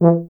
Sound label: BRS TUBA, Solo Instruments